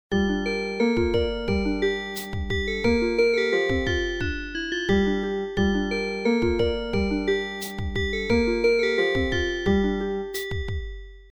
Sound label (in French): Melodie Carillon musical